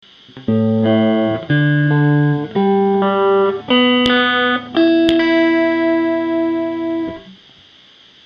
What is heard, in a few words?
tuning